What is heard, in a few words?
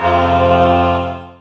unholy!